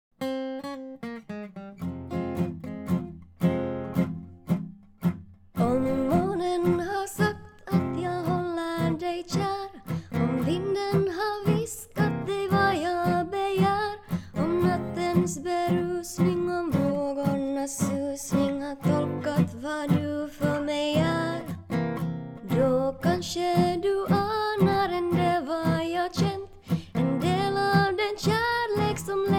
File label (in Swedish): sopran